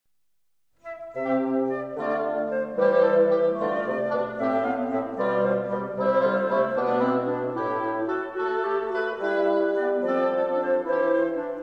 Ensemble des bois, orchestre symphonique

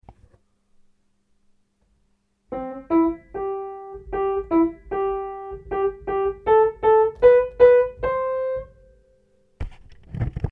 rythme 2 son

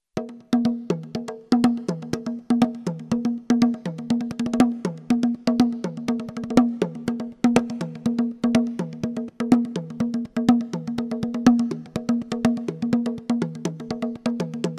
bongos

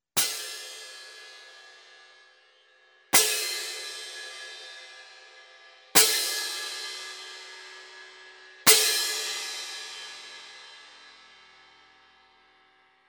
cymbales

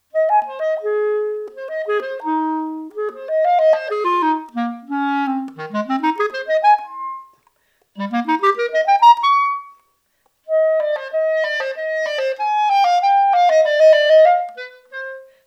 clarinette

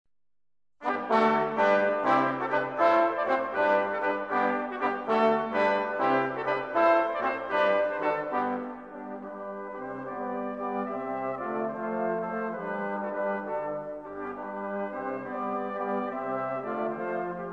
ensemble des cuivres, orchestre symphonique

